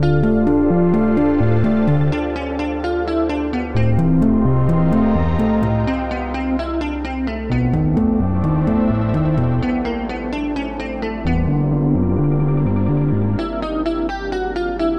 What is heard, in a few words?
EDM 8 Bar Song; Reason